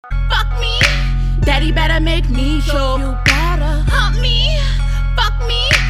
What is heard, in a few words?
moan